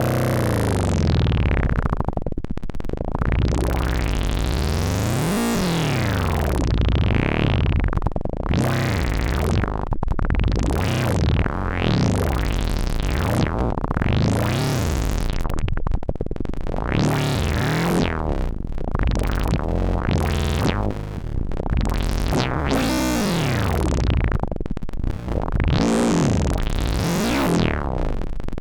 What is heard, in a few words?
Wavetable OSC Filter FM OSC FM Feedback